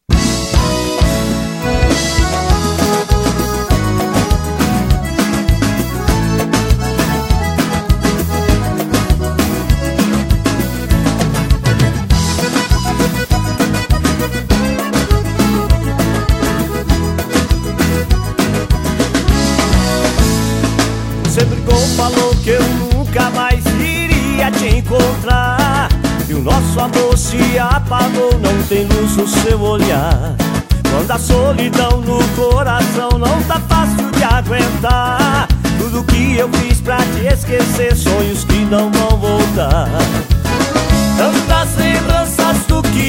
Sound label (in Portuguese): viola do avesso